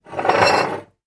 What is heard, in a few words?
household glass bottle scrape slate board; sound effects one